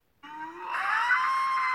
Woooo

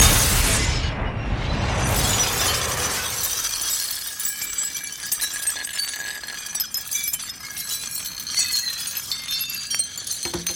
Crash

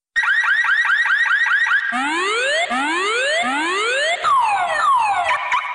Reveille; Iphone4s

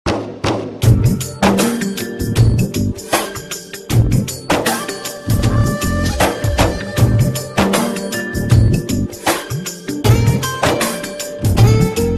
Sad Tone

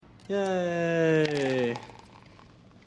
Yaaaaay

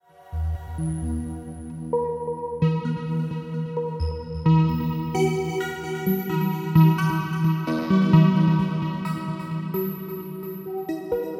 Synth Arp

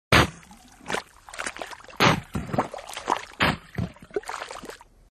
Mud Gooshes Sms

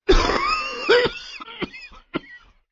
Man Cough

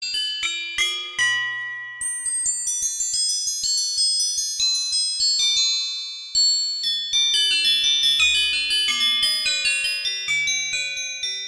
Noisy Bells

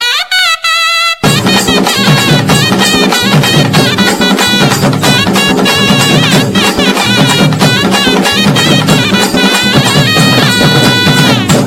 Kuthu Tone

